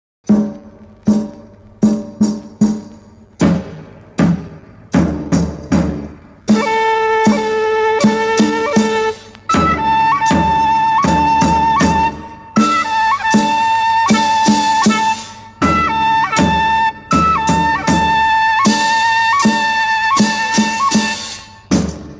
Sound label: instrumental